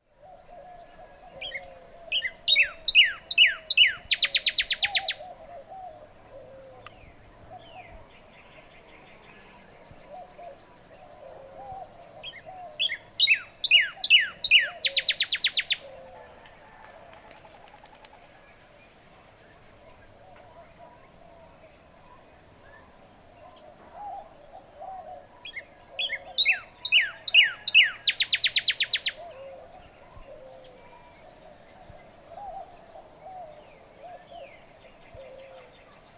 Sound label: Northern Cardinal